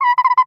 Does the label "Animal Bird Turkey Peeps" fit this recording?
Yes